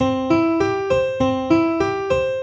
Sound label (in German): C Dur aufsteigend